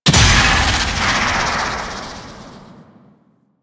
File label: Grenade Explosion Blast; Enemies; Warframe; Infested; ZealoidPrelate